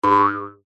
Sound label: cartoon spring boing jump jaw harp